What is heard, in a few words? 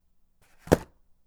Unplugging A Guitar